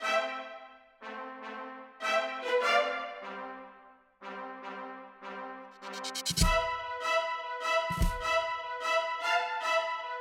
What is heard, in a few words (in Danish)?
combat musik 150bpm intro og outro og loop